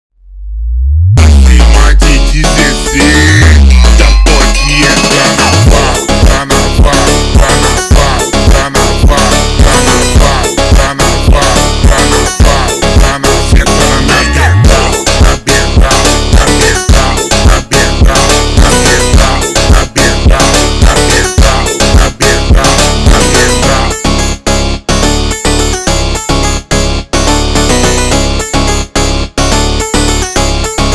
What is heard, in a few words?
slowed, funk